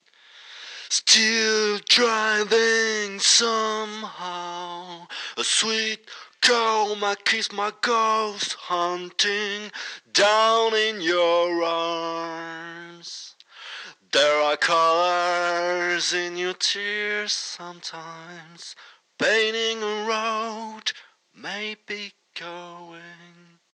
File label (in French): voix